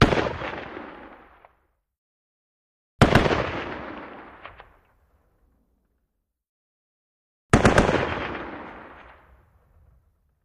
.50 Caliber: Single, Double, And Triple Shots

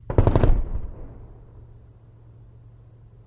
Machine Gun Lrg Rapi, bursts

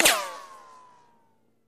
Bullet Ricochet Sin

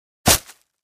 Foliage Impact Object Dropped on Ground; hits & impacts

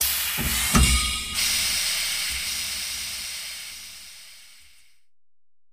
hydraulic release

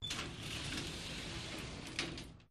Elevator Gates Doors

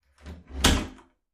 door wood close

